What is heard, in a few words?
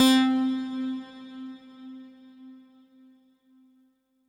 Synth C, Synth Prophet One Shots; Electronisounds